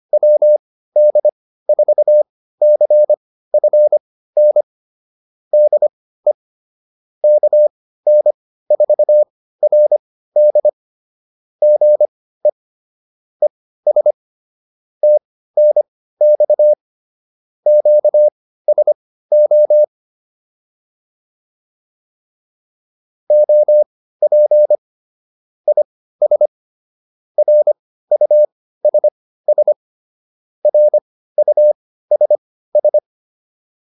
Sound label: QSO, intermediate practice files